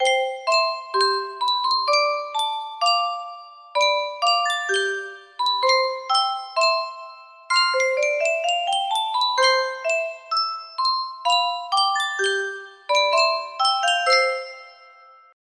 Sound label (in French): yunsheng boite a musique, music box melody